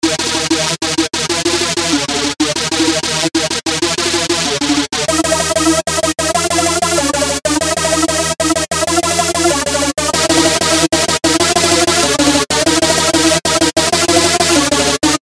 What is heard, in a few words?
Oldskool Synths